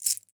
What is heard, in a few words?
Ideh Seed Rattle, World Percussion; Cymatics; Emperor World Percussion